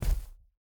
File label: bear footstep grass hard; Rust